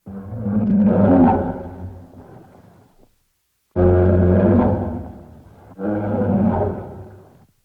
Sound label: Mastodon Growls Dinosaur